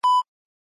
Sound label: beep once; i-mobile i-style 5i; ringtones